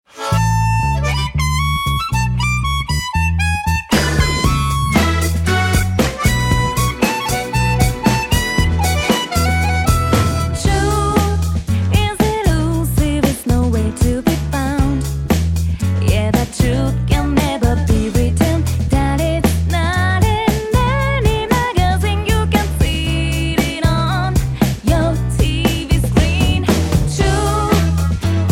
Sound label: Battle; Persona 4 Arena Ultimax